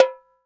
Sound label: Tamborim Open, Percussion; Roland BK-5